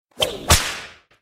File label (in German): sonnerie Peitsche